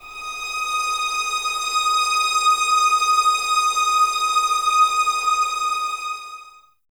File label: VS D#6, 11 Violins, Mute; Miroslav Vitous; String Ensembles